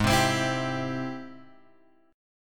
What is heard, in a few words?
G sharp Augmented Major 7th G sharp, guitar; G#+M7 Chord {4 3 X 5 5 3} strummed